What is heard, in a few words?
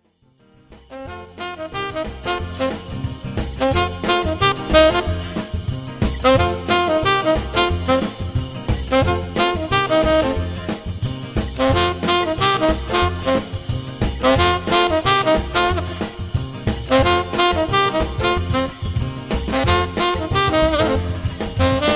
solo sax